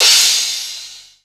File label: crash cymbal sound sample b key; cymbal drum samples